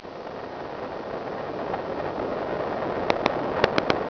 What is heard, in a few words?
radio